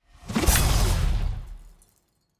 Orbital Dagger Death; TANK; 3Souls

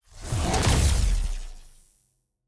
Emmiter Crystal Connect; TANK; 3Souls

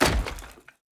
environment object barrel breaking

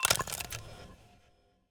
dark crystal obtaiment

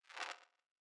zap

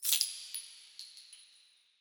ui debt

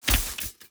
environment zone metal thread break

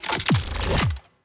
Demon Mine; TANK; 3Souls

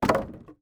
environment object drop wood

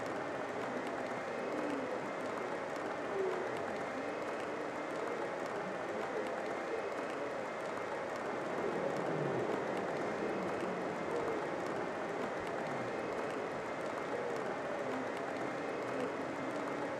ambience room crowd arena

